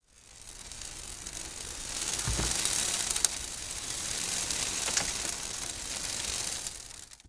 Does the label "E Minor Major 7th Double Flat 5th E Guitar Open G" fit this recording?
No